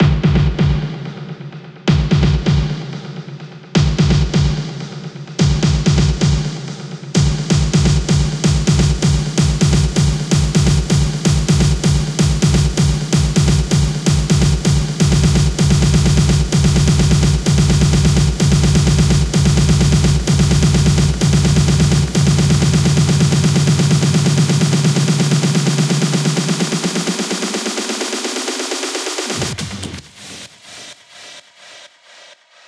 128BPM Epica, House Electro Fills; 16Bars; Vengeance Samples

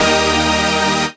Synth Shots; Vengeance Samples

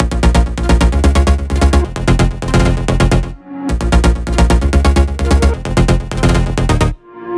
130BPM Melody; Vengeance Samples; VFH1 130BPM Remnant Kit

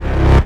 Bass C, Offbeat Bass

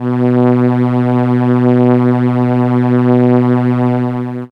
Cello B2, ARP Solina; musicradar-synth-samples

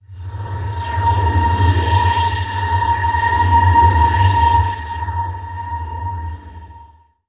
VFX Atmos

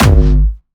Jumpstyle Kick G#1; Jumpstyle Multis; vengeance essential club sounds-3; VEC3 Bassdrums

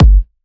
126BPM Purple Kick; Vengeance Samples

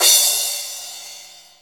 Cymbals Crash; Vengeance Samples

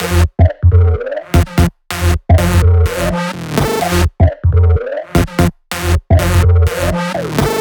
126BPM Thai Melody; Vengeance Samples

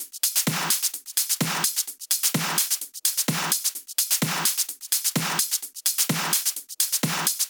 128BPM Kit; Vengeance Samples; VFH2 128BPM Automata Kit

